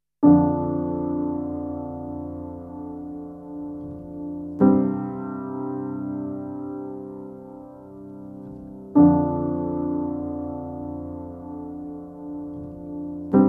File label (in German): klavier